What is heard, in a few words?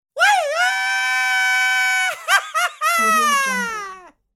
mexican mariachi shout; applehillstudios